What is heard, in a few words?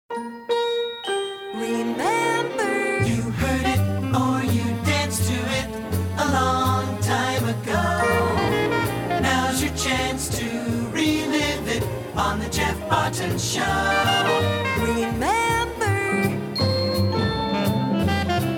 Jinglebells